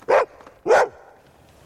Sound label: barks with echo